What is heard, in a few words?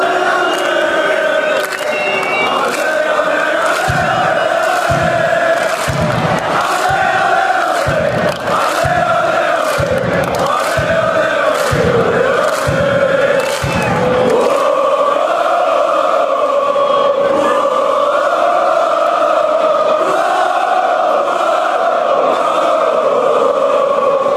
ohohohohohhhhhh, chants